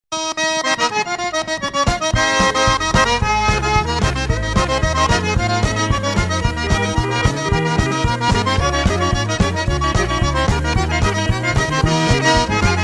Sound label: Cajun